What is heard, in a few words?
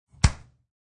Punch to the face.; Punches and Hits; Combat